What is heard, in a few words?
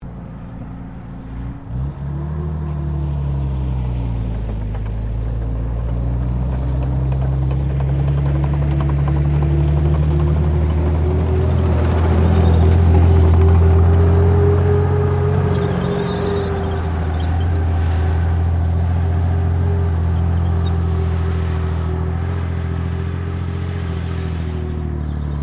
TANK CENTURION MANOUVER, British Centurian main battle tank; Truck Sound Effects; Trucks (Sound Effects:Vehicles)